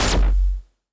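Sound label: laser beam; Laser Beem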